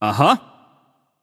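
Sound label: nonverbal agree; voicepack; smite; merlin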